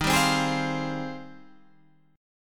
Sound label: Ab Major 13th Eb